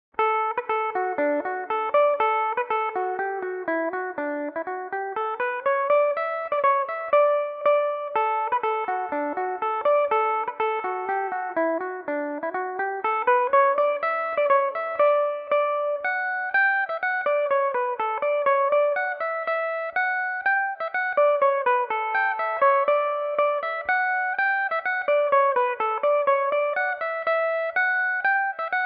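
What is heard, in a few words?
D major, Irish mandolin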